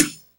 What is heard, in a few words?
projectile fire